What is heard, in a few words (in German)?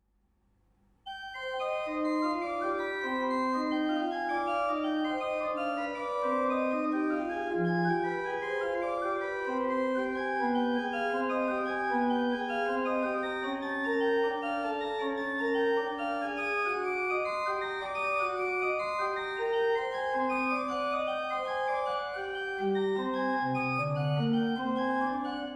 Das Glockengelaeut zu Rom; Das Glockengeläut zu Rom dem Vatican; Barocke Orgelmusik in der Oststeiermark